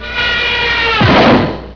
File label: crash